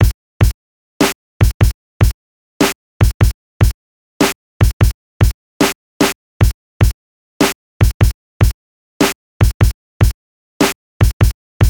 Metronome; underhero linux macos windows gamerip 2018

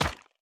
Weapon Furniture; Going Under

